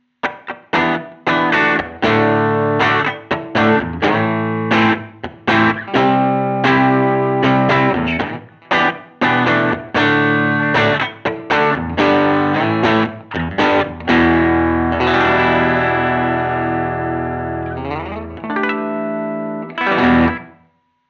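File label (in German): Two Notes Le Crunch kanal a strat steg pickup wall of sound iii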